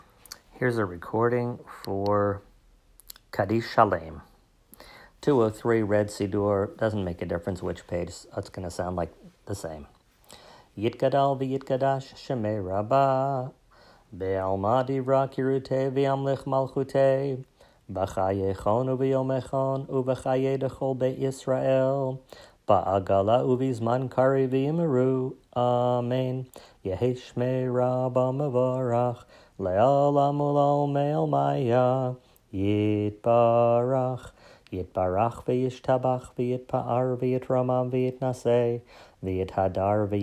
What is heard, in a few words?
Kaddish Shalem